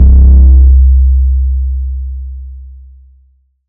808 Bass Grunge C3; Slate 808 Deep Dive; SD Signature 808s

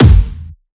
kick monster; Drum Sample Pack; One Shots; Slate; SEISMIC

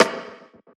snare oneshot, Pop Sample Pack

